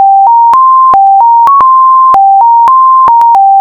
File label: Faster